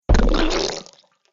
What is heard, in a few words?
Muddy Puddle Splat; Slimy Sound Effects; sound design